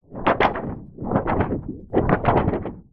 hospital xray sheet handling wobble

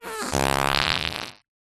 cartoon fart

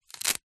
human hair pull rip; zapsplat

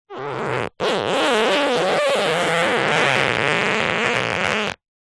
industrial duct tape rub finger squeak

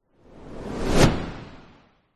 whoosh fast hissy low fi; sound design; zapsplat

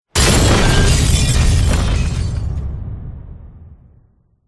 explosion large breaking glass boom; zapsplat

